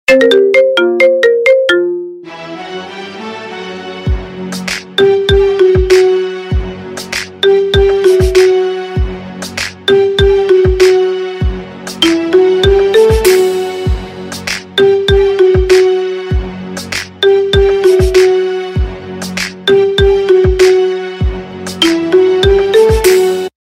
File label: Marimba Music